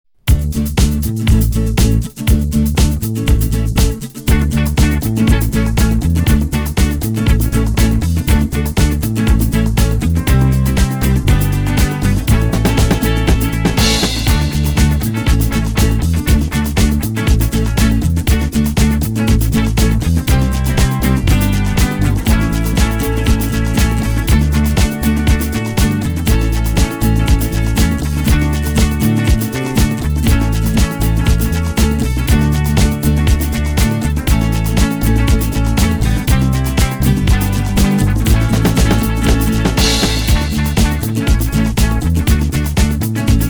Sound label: Hello song Karaoke